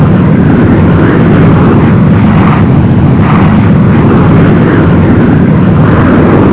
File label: exhaust loop; fgdata